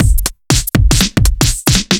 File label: Kit 2 Heavy Swing 120; off the grid